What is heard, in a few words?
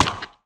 axe mining tree; yumako; Factorio